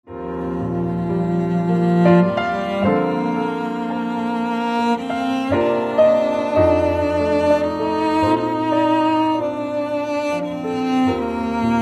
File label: acoustic quartet blues